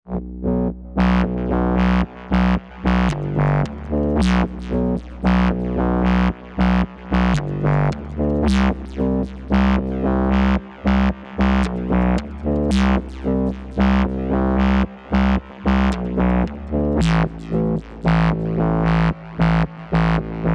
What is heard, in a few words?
Tone2 Icarus